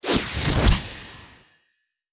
Mage Skill

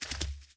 Horse Walk

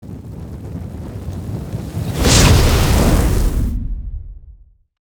BOSS Fabao Skill

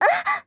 BOSS woman hurt

